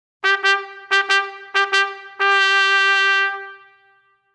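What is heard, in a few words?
Double Time, bugle call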